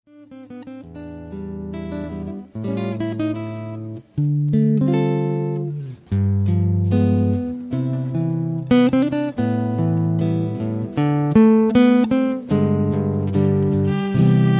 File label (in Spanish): Guitarra Violin; Ensamble: Guitarra & Violín